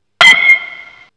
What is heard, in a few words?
Metal Baseball Bat